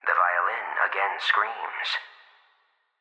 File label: Violin; Sammy Puzzle Diary